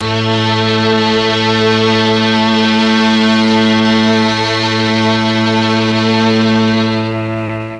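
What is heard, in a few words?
A1 mixed strings, mellotron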